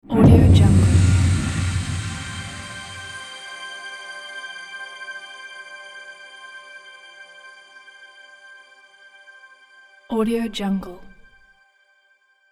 star particle impact trailer hit

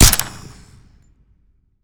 sig p226 suppressed single shot urban; soundmorph

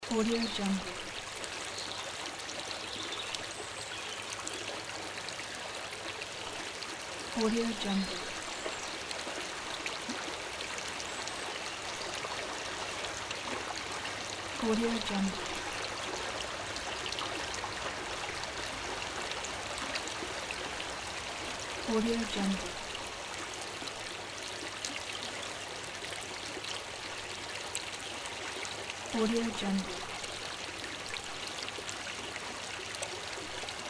stream loop

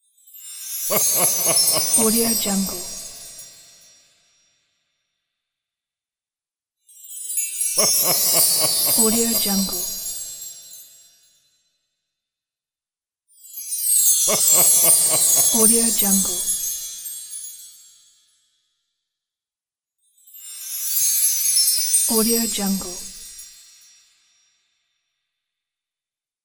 santa laughing

